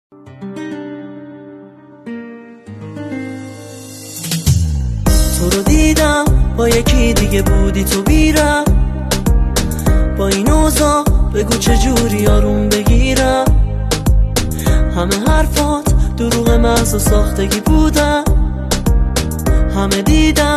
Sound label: Emo Band